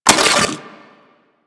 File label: Split Laser Reload Start; Warframe; Corpus